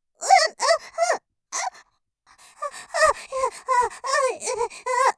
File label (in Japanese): 絶頂余韻 喘ぎマシマシ; おかずにスパイス２; 勢いマシマシ